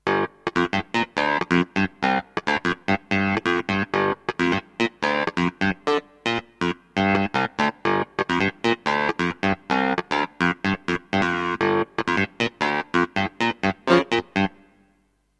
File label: Clavinet Release Time 0